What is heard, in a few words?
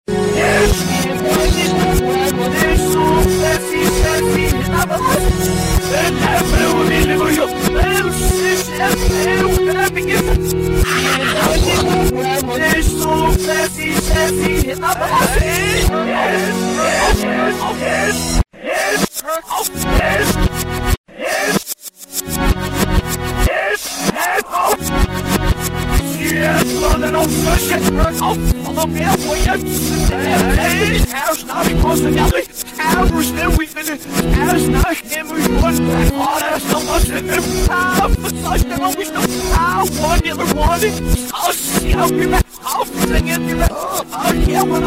piano car, reverse